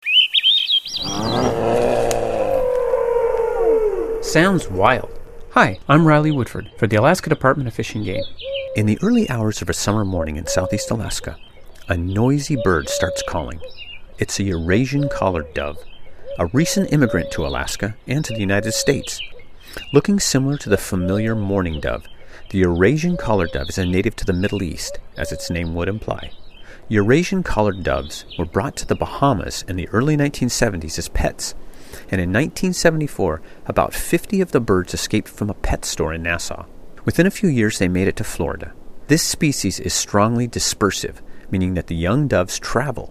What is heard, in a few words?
eurasian collared doves